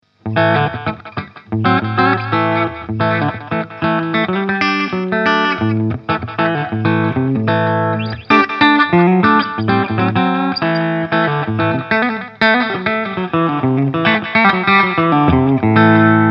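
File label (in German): Danelectro 59X steg full clean, Gitarre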